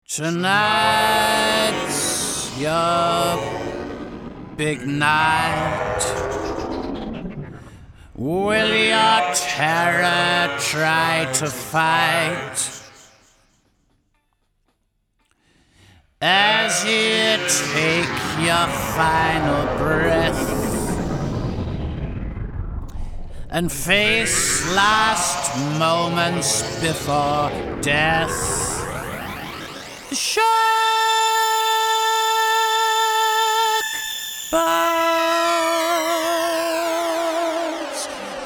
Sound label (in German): Gesang Pitch Shifting Reverb; LXP-5